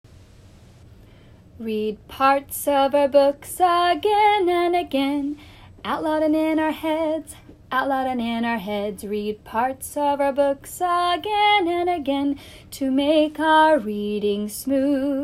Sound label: reading song recorded